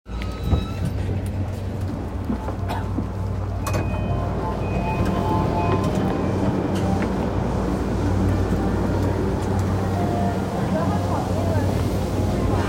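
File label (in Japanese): 山手線 原宿 counterclockwise, train sounds; JR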